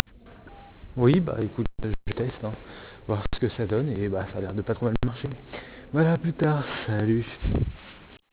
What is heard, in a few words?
siphon